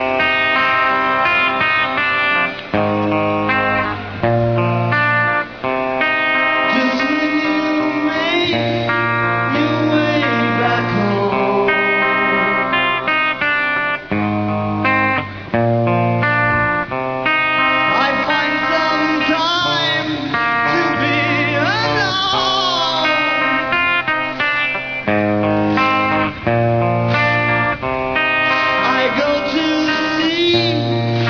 prison song; cs mydvor

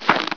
snow

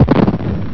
cannon; mario5

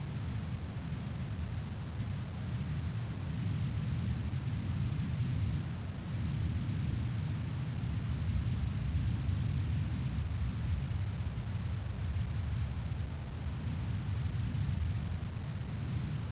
basement

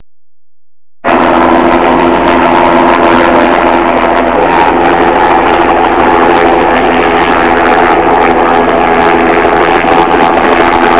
helicopter

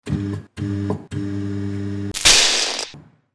button fail

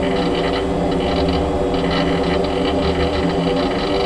lab gear

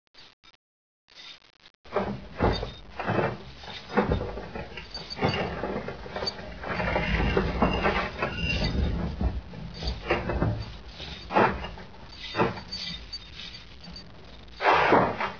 attic

